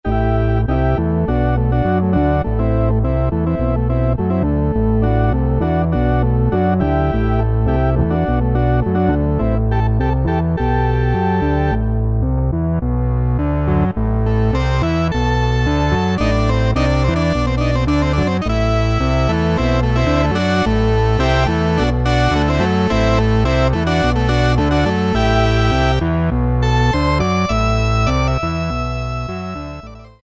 OR Combo, Yamaha TG77; Factory Presets

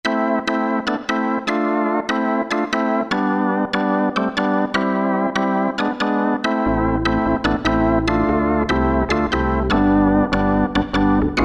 Organ, Cheetah MS6

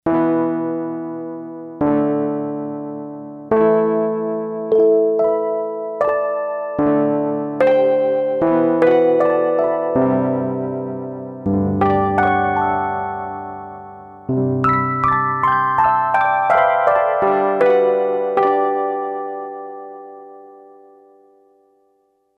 Roland SC-880; Factory Patches; 123EP&456BSforGR

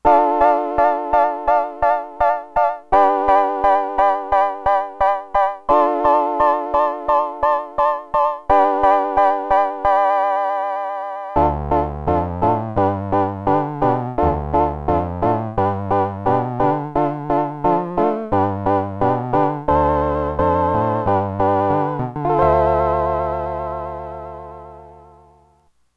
High Piano with vibrato, Multivox MX-20

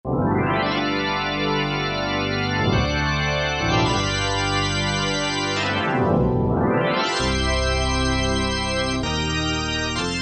Rock Organ, Roland D-50; Factory preset demos

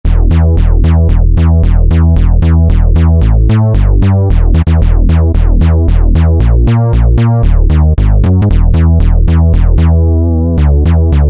Ba-Pulse Smack, Yamaha EX5R; Factory presets

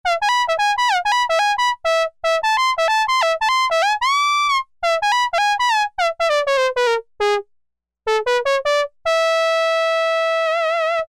trumpet II, Korg M-500 micro-preset

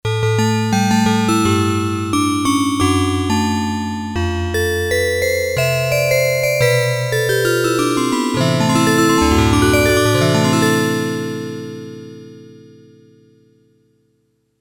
Sy.Perc., Yamaha DX11; Factory Preset Voices

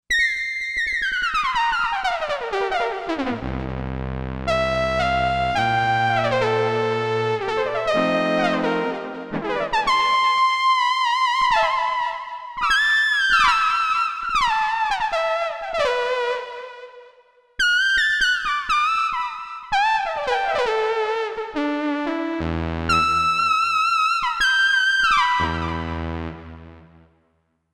PM synth LEAD, Roland SC-880; Factory Patches

